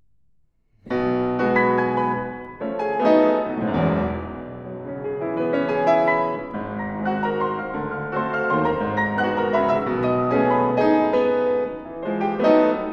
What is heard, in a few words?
Etdues Pour Les Octaves, Piano; DEBUSSY L Oeuvre pour Piano Vol 5